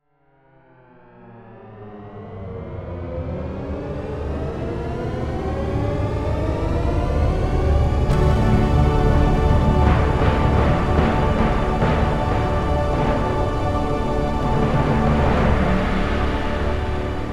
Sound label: THX Chime